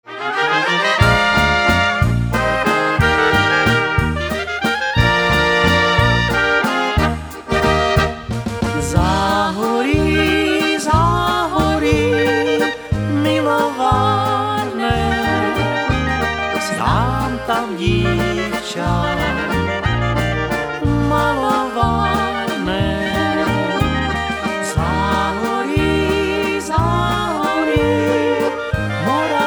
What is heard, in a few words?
Waltz